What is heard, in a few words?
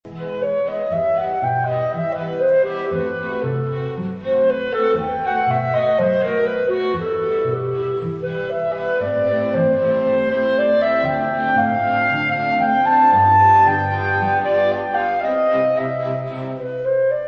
Clarinet Quintet KV581 In A Major; Mozart